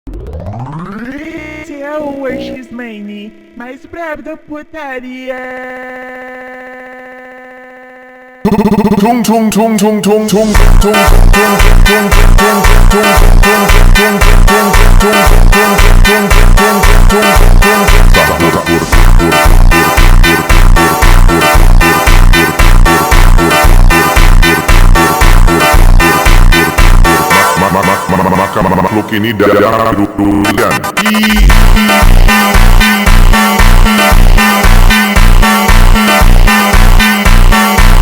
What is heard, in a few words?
funk slowed